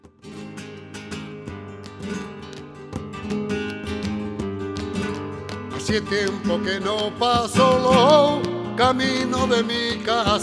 Tangos, flamenco; de Manuel Tejuela

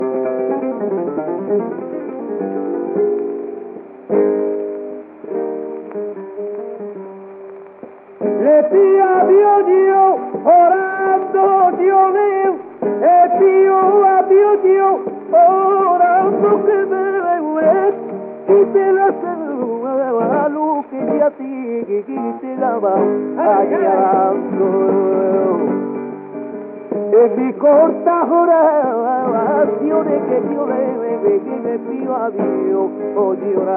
Solea, flamenco

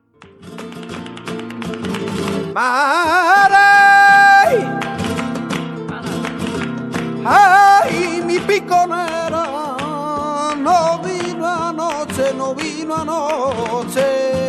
Tangos, flamenco; de Ramon el Portugues

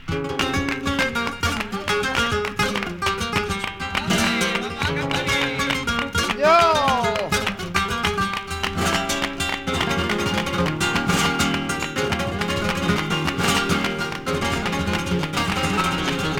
tango, flamenco

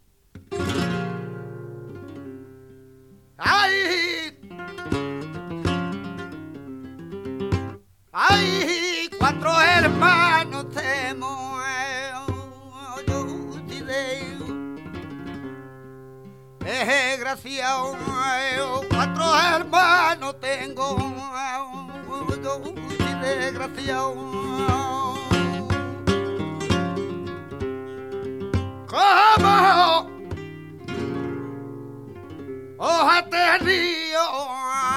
siguiriya, flamenco; sanlucar

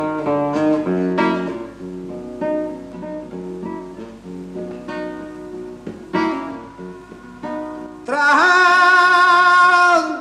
Garrotin flamenco

